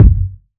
tight kick drum F sharp key; kick drum samples

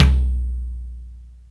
verby tom sound B key

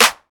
sharp acoustic snare sound a sharp key; snare drum samples

